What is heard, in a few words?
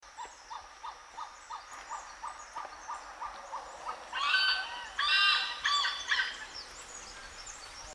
malabar pied hornbill